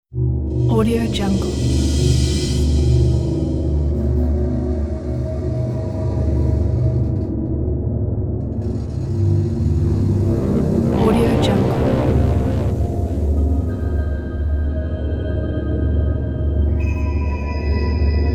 suspenseful city

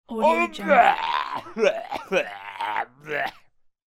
male yuck vomit voice

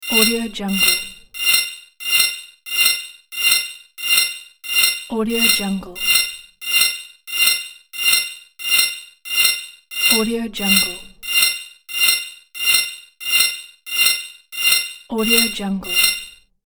item attraction spell

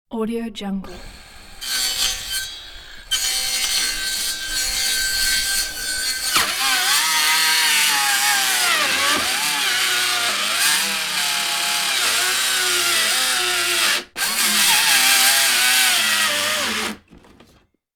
circular saw cut

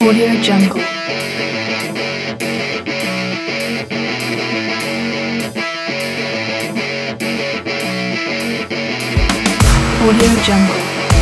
extreme

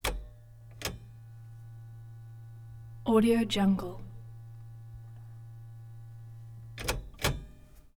1941 cadillac car

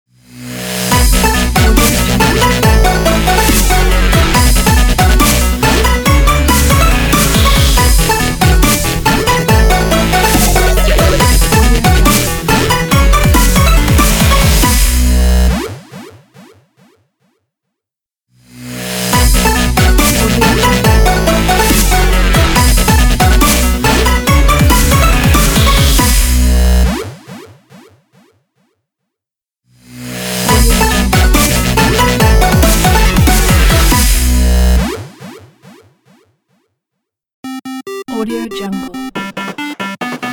8bit dubstep logo intro pack

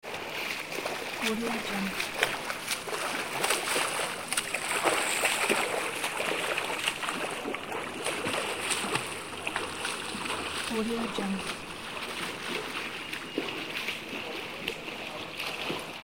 splashing surround water

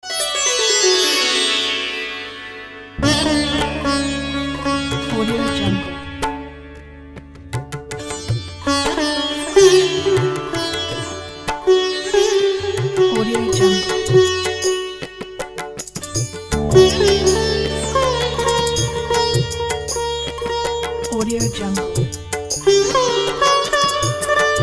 desert sitar

